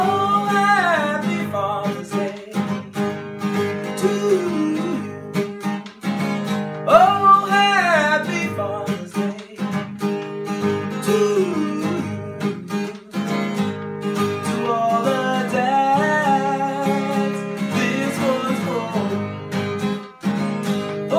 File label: father day song ringtone